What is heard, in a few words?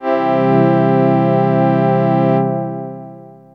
syn orch, dx7